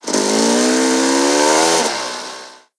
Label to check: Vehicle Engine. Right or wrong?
Right